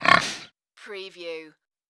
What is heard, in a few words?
creature vocal